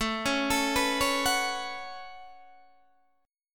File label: A 6th Add 9th A down Guitar Open D